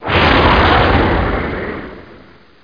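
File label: air burst; mission sound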